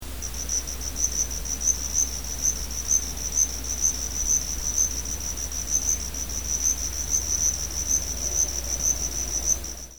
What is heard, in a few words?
hibari; yamato